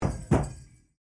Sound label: Drumming and Singing from a Pow Wow; Isle de Jean Charles